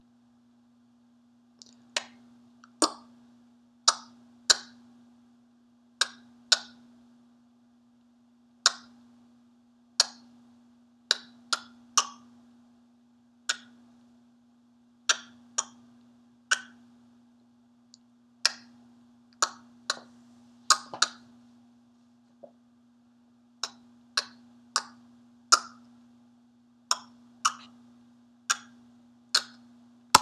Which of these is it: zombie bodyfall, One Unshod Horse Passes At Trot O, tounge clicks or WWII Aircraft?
tounge clicks